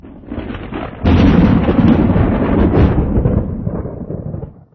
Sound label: thunder